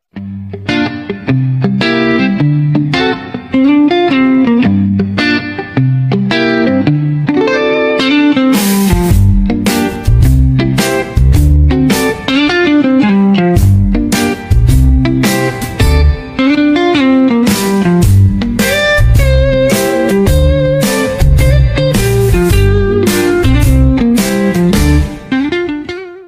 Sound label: Simple Guitar; Tecno HiOS 16; alarms